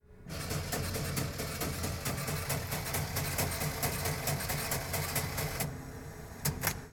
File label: Atm Print Receipt